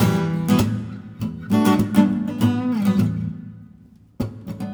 Stripped funk, Acoustic Guitars; ACID MUSIC

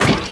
CRASH; PC Gamer 49

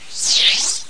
s0poof5

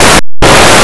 tv

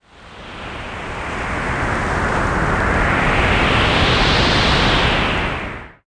WIND OUT; Marbles

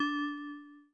Church Asterisk; Christmas

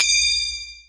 craft success; DAoC; Toa

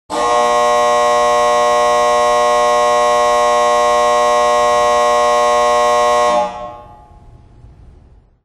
game buz

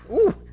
ooh

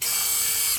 menu Sound; ROBOTS

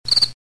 Cricket Chirp; Voice Cloak Plus 1.0.43.0; Acousticons

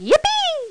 yippee; Red hiding hood anim

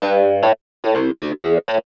synth house 125 A; eJay; Keys

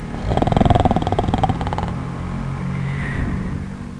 sleep in truck; Crosscountry USA Photo Safari

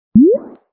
pop; Puzzle

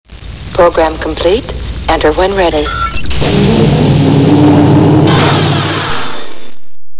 enter; EXPRESS

